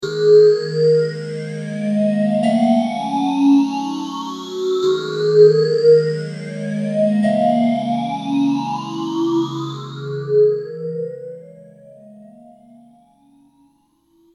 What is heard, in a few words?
m1000 boss ph3